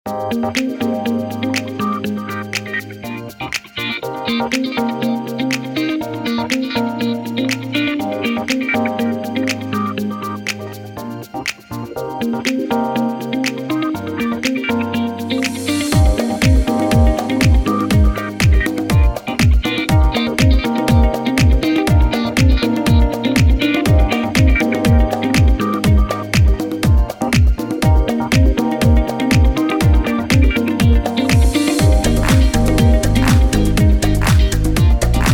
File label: Upbeat